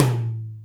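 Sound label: Tom Ambient Hi, Alesis D4 drum machine; 300 Drum Machines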